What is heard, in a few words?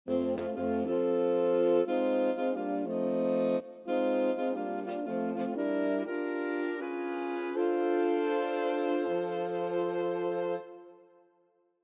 Tenor, barbershop tags